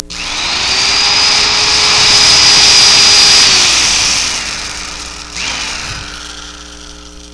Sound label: DRILL